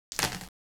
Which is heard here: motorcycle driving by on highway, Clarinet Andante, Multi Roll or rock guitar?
Multi Roll